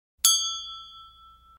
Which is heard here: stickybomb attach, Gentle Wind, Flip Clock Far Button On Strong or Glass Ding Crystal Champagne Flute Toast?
Glass Ding Crystal Champagne Flute Toast